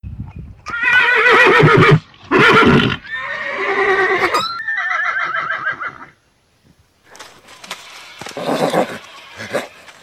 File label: horse